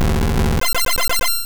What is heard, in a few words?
Tri Attack; sfx; attack moves; pokemon; gen 2; gsc